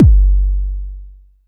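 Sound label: vermona drm1 mk3 bass drum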